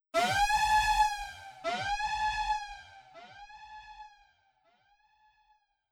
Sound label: jp8 elephant